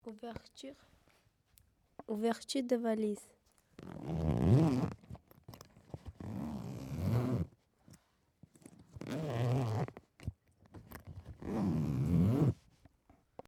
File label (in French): ouverture de valise